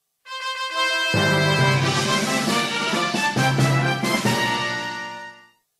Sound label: BGM Old Fanfare Victory